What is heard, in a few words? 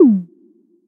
reels start; Back to 70s; wazdan